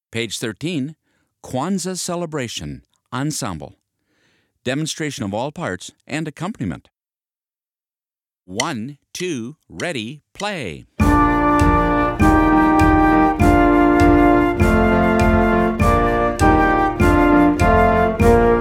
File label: Ensemble Demonstration; Kwanzaa Celebration